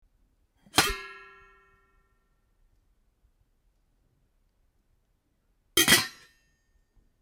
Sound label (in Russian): kastruli otkr zakryli